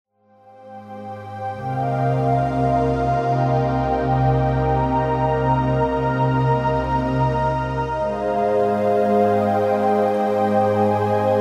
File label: meditation, relaxation